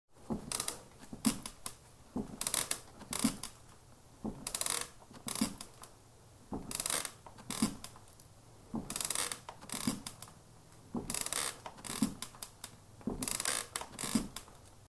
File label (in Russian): kreslo kachalka